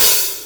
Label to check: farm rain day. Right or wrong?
Wrong